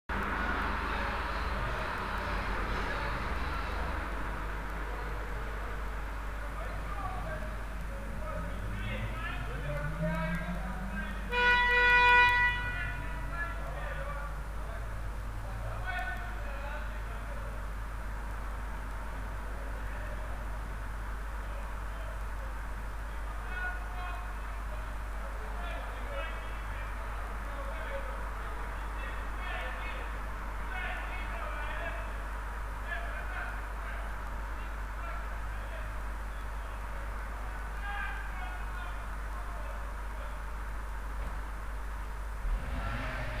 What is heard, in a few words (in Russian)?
zvuki goroda nochju